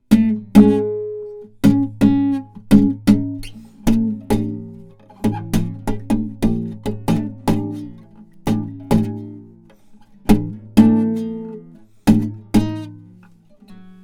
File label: rhythm